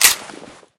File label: hunting rifle bolt forward; Left 4 Dead; Source Engine